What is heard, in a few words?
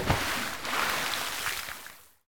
splash; Lux Ahoy